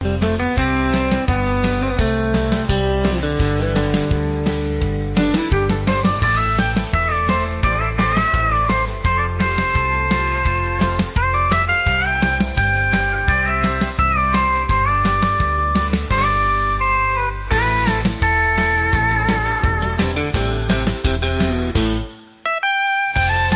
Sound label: country